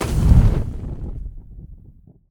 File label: Explosion Fire; KriptoFX; Realistic Effects Pack v1